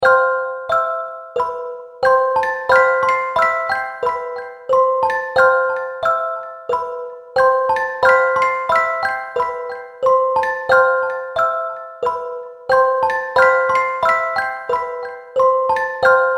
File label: Slow Music Box; Musical Sound Effects